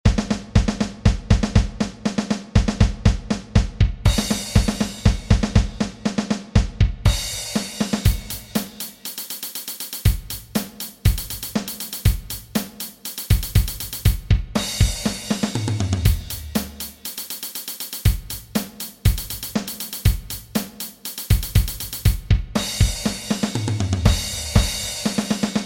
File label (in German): Drumsolo; Ratatam